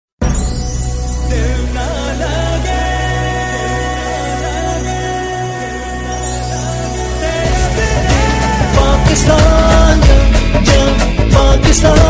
Remix Ringtone

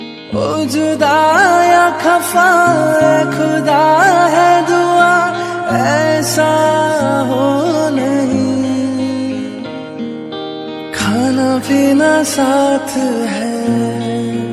Old Hindi Song Ringtone

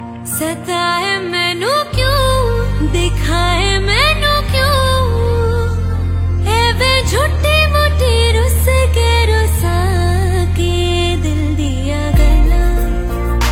Romantic Female Hindi Song Ringtone; ringtones

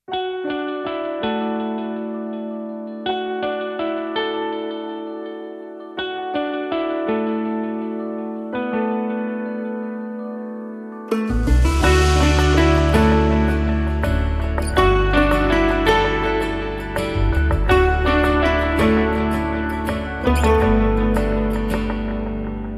Romantic Music Ringtone for iPhone

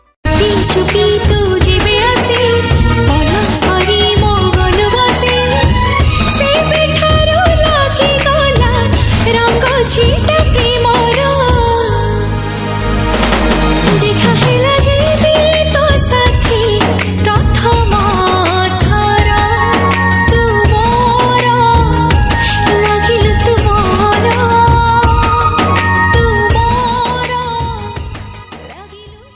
Odia Ringtone

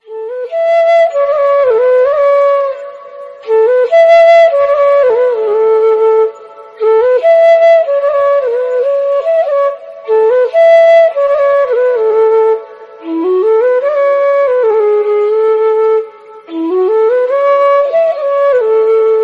Flute Version Ringtone

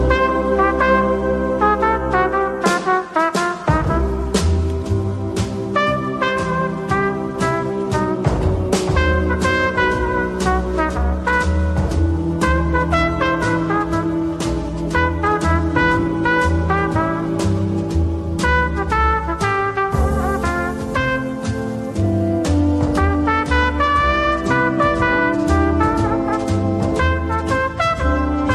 Instrumental

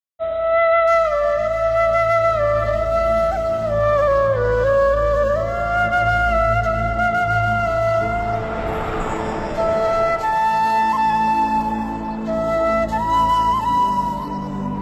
Radha Krishna Instrumental Ringtone; ringtones

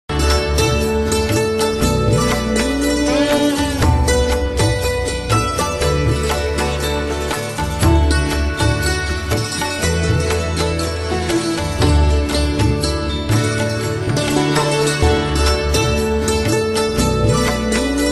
Om Bhur Bhuva Swaha Instrumental Song; ringtones

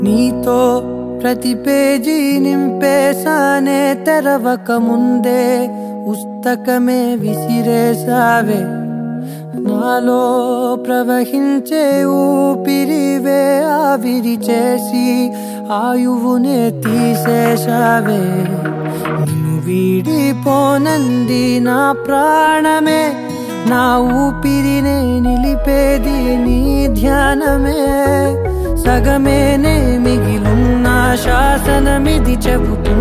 Telugu Song Ringtone

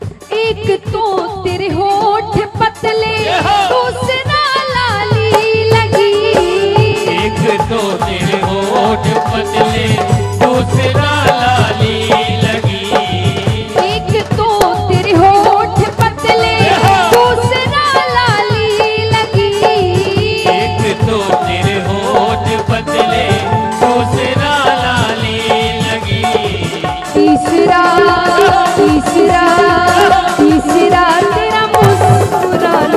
Bhajan Ringtone

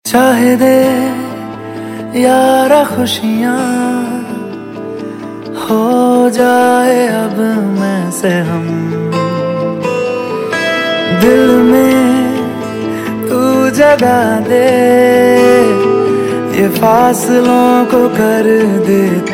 Romantic Hindi Ringtone

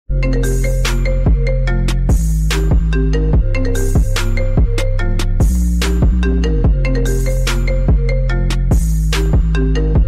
Famous Phone Ringtone; ringtones

